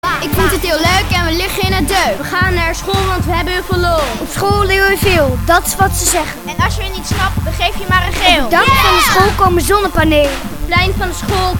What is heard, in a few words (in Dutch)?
Rap; schooldakrevolutie